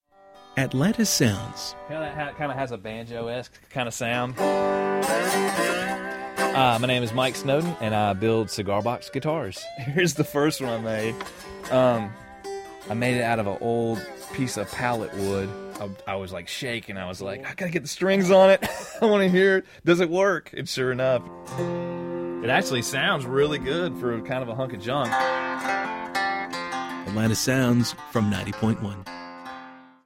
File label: Cigar Box Guitar